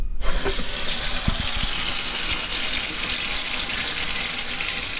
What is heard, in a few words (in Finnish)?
vesihana